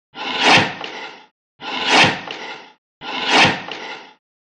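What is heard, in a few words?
Guillotine Hand Operated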